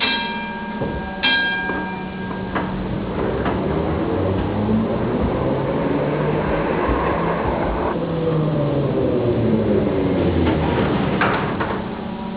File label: train